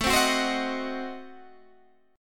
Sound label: A Diminished 7th A down Guitar Open G